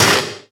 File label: radio impact lg, metal; physics